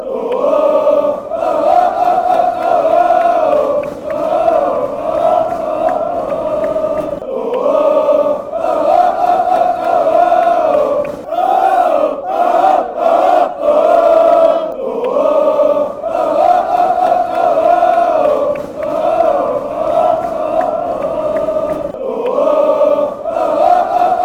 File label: oh, fanchants